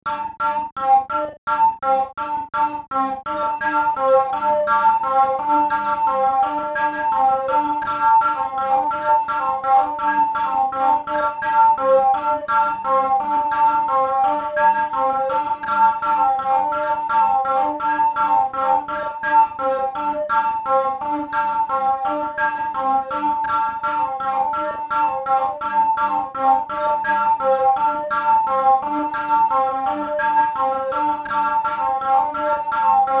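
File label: flute flicker tribal; 2006 Film Score Inc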